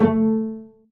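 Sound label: STRING PIZZICATO A2, STRING SECTION; AKAI S6000 CD-ROM - Volume 1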